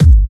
warm steel kick drum g sharp key, bass drum one shot tuned to the G# note; kick drum samples